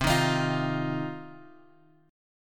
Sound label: C Minor Double Flat 5th, chord; Cmbb5 Chord {X 3 1 X 4 1} strummed